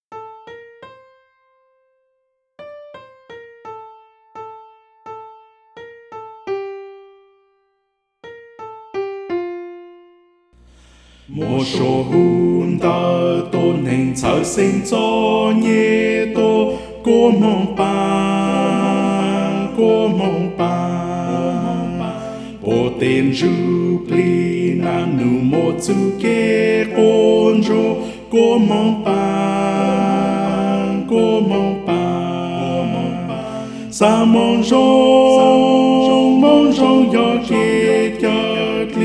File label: soprano